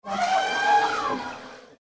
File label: trumpet